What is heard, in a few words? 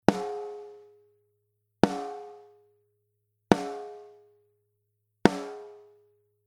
Comp Snare CL1B, snare; eq compression